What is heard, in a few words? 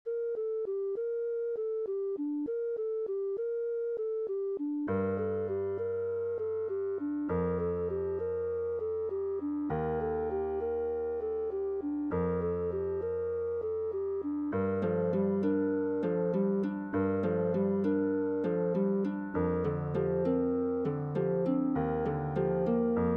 Ambient Song